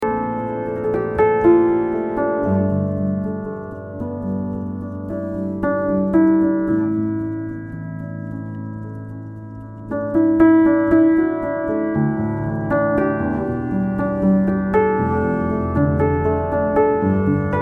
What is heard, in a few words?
piano diary